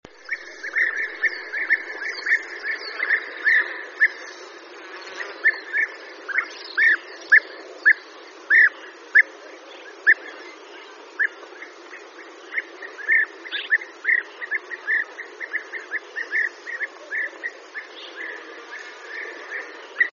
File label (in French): guepier d europe, chant